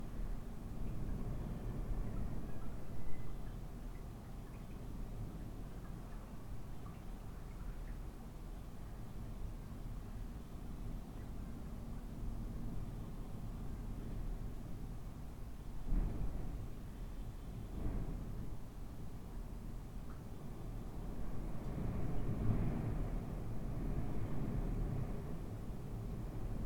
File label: Room Tone Windy House